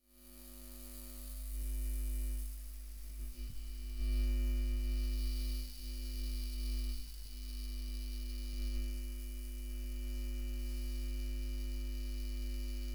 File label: Emissions Microwave